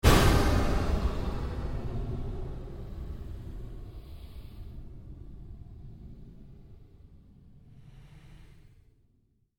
Created Pulse Fade, Rise And Dive Sound Effects; Sound effects created by manipulating other sounds; Rises and Dives

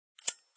Flint lighter.; Flick Sound Effects; Objects

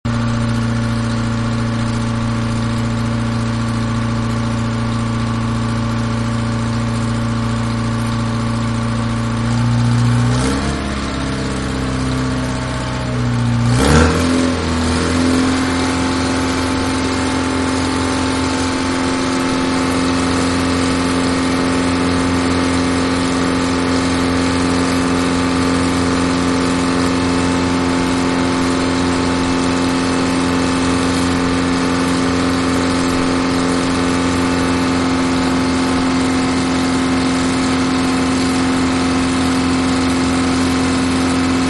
Crane Motor Noise; Construction Vehicles (Sound Effects:Vehicles); Manhattan Production Music

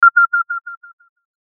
CLICK HIGH FREQ FLUTTER; Clicks; Blastwave

